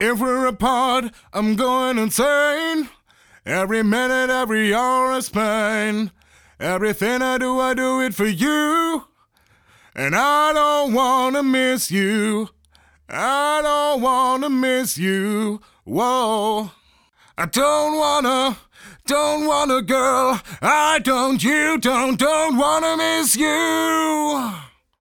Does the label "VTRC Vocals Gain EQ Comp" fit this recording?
Yes